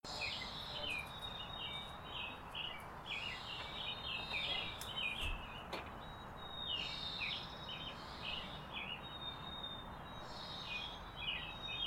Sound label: birds early morning recording